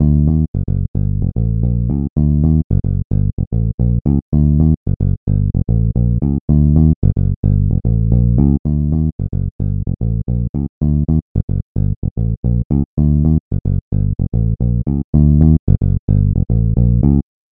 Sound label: Dull Fingered